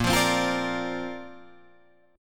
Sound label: Bb Major Add 9th, Bb, down, Guitar, Drop D